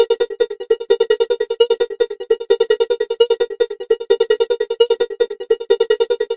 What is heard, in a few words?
stutter lofi round synth; SYNTH AND ELECTRONIC